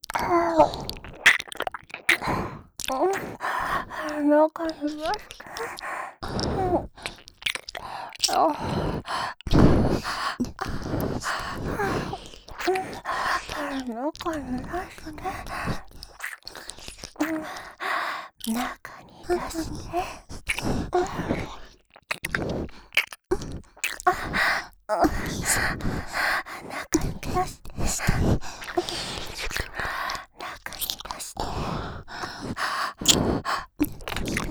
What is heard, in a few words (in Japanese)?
中に出して♪ 耳舐め喘ぎ; ロッテ